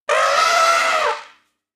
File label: win Elephant; jungle spirit